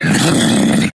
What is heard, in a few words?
snork agroed growl, mutants; STALKER; customnpcs